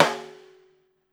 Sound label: STR SNARE, Brazil Drum Perc; E-MU Producer Series Vol. 7 – Old World Instruments